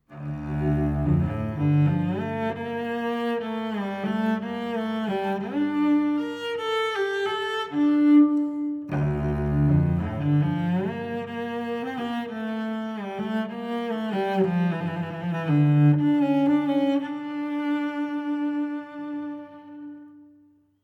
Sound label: Cello